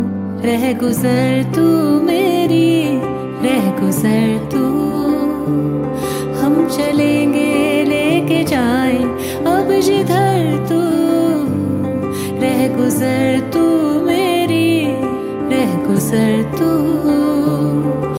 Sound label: ringtone bollywood ringtones